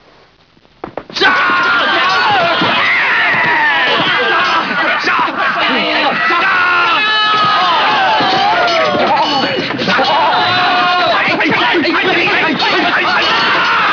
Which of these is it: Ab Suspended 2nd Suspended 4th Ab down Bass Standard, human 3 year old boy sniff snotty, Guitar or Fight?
Fight